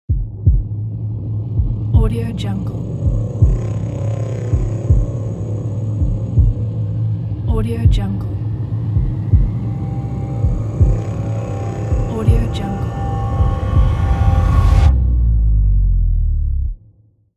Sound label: tension logo